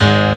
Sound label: Piano 5th sequence; instrumente